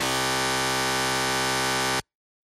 Quiz Wrong Buzzer

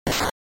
Attack, NES RPG

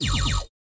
Lazer

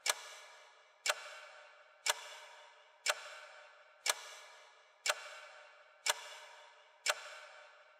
Clock Second Hand Reverb Loop

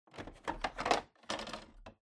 Air Purifier Tank Remove

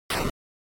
Noise Phrase, NES; NES-General

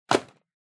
Newspaper Put Down

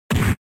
Fighting Hit

